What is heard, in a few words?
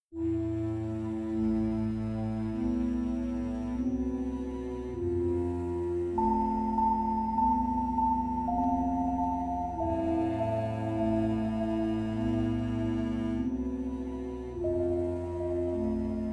Pause Music; The Curse of Nordic Cove